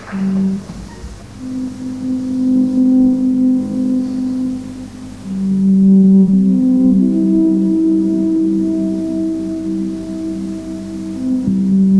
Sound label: Alphorn; Hilton 1984 Original tape